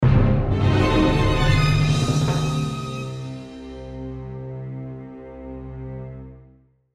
Orchestral Ascend, Orchestral Ascend Percussion Swell Dreadful; Musical; Blastwave